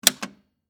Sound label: Telephone Check, Technology, Telephone, Check, Hook, Switch, For, Dial, Tone, Pay, Phone; BlastWave